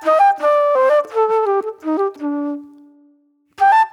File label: Movie Flute